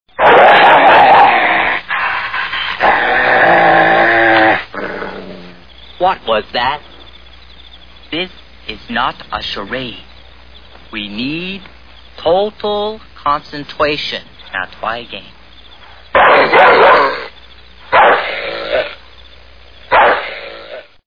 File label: dog